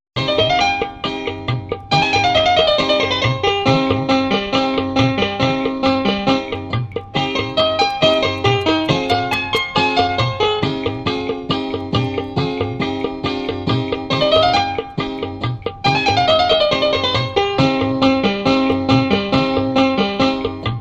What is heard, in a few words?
instrumental